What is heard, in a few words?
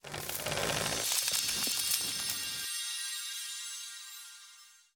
fs Beanstalk Grow; jack and beanstalk